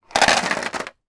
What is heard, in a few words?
leisure board game dice tip into plastic housing on box